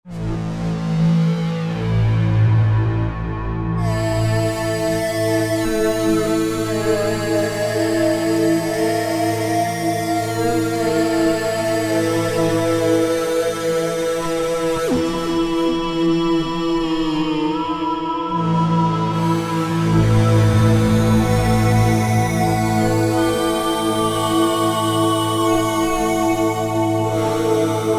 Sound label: Roland JV-2080, Tortured